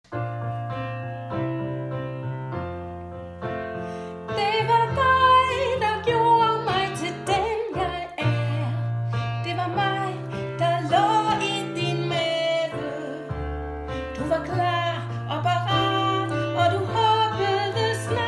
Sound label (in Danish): dine øjne sopran melodi